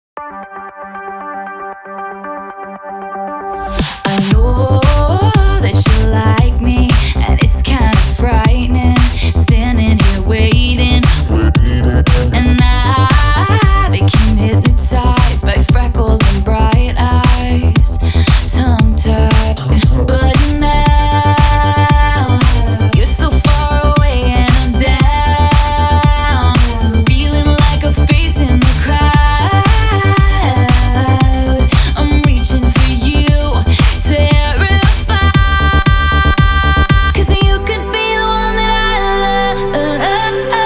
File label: remix